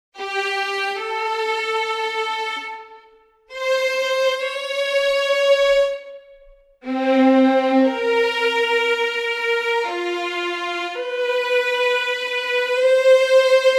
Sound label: Chamber Strings Violine 1 Legato